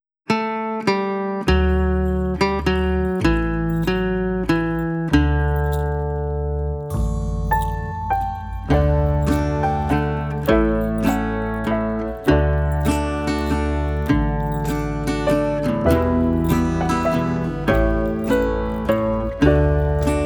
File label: Karaoke; Auf dem Berge da wehet der Wind